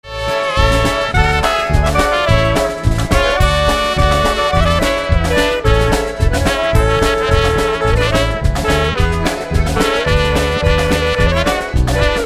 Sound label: Polka